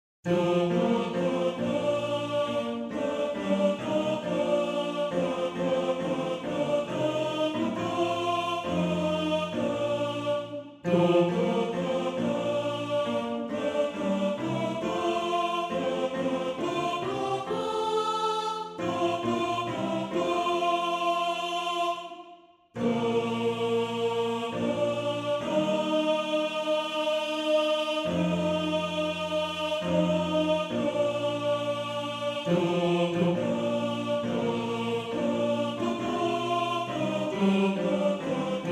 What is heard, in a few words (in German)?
Tenor; Tenor 1